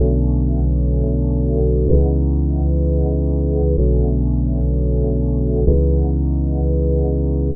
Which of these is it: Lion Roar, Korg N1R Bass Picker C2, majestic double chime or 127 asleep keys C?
127 asleep keys C